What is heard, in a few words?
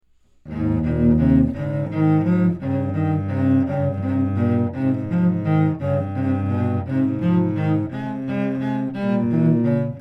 Etude for 2 Cellos